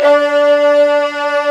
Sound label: VIOLINS A2; AKAI S-Series CD-ROM Sound Library VOL-2